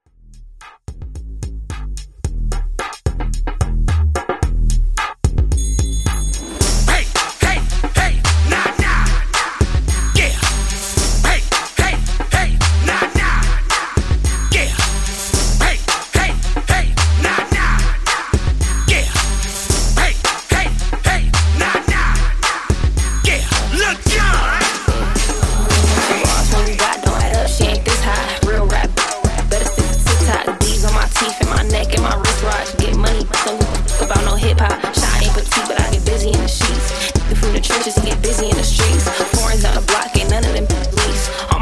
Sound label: Electro Redrum 110Bpm